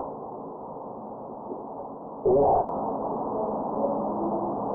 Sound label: panasonic hum